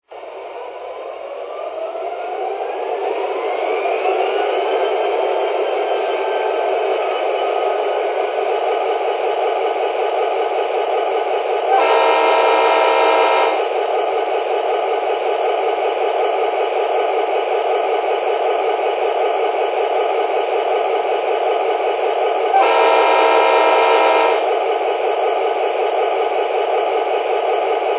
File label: gp35